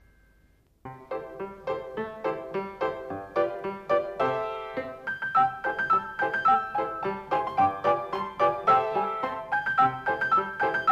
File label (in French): La mesange Playback